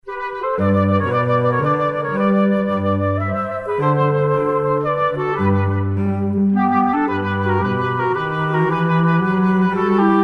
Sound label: beethoven minuet in g; 1795